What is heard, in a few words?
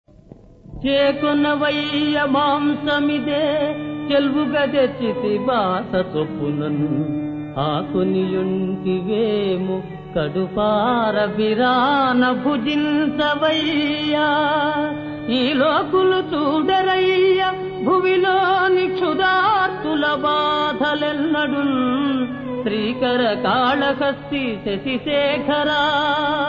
Padyam; KalaHastiMahatmyam